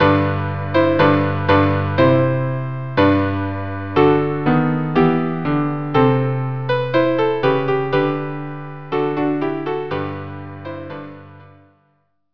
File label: trio